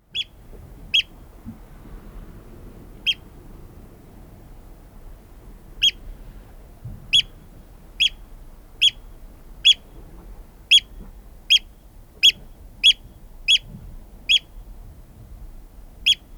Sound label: Oystercatcher